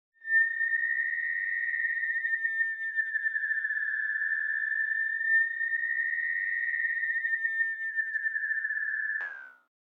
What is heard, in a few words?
Feedback Beep Tone - Sci-Fi Long Space Beep; Hollywood Edge; sound designer's toolkit v2